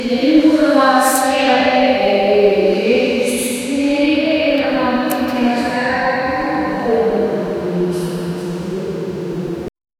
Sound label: the echoing noise inside, prison